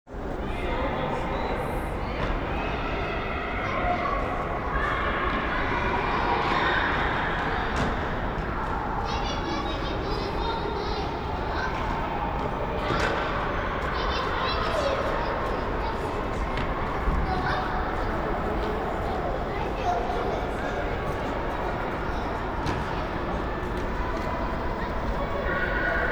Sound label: ambience